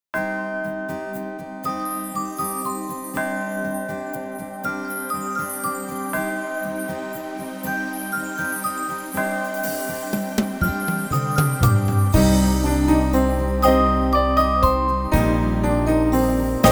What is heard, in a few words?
Ukrainian Bell Carol; SLOW